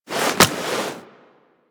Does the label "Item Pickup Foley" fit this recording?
Yes